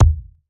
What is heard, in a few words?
Ball Collision Geo Tackleball Hard